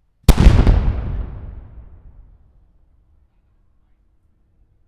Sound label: Cannon Center; Telarc Sampler, Vol 5